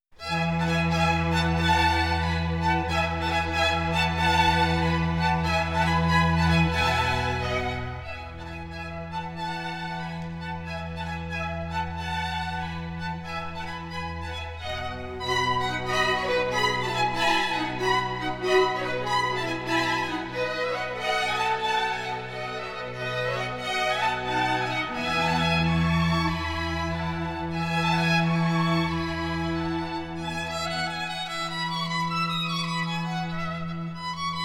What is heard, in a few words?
Violin Concerto, third movement; Four Seasons No. 1 Spring (Vivarudi); Legend of Galactic Heroes; Galactic Empire SIDE